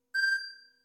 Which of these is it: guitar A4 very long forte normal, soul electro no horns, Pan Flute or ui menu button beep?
Pan Flute